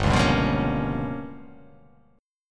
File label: G 7th Sharp 9th Flat 5th